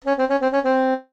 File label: jingles SAX; Kenney's Sound Pack; Music Jingles